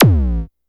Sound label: Classic Synth Drum